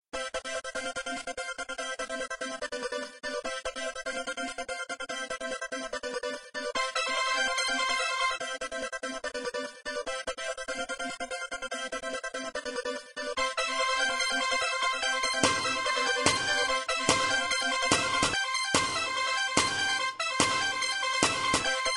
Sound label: rhythm